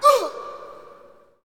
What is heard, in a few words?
Hockey Male Hurt; SFX Kit; Hockey Vocal Sounds